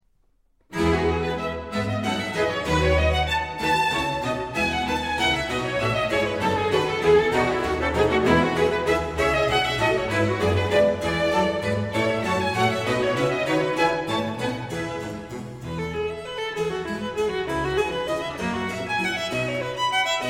iii allegro assai aus violin konzert nr 2 in e dur bwv 1042

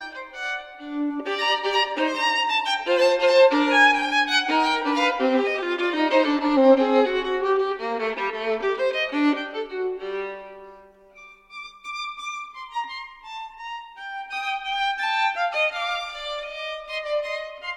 sonata g moll fuga allegro; sonata i; bwv 1001

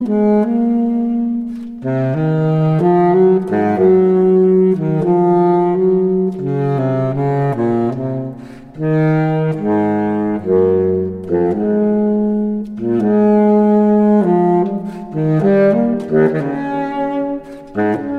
sarabande baritonsaxophon

